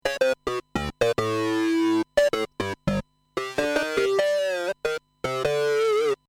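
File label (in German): klassischer sync, synth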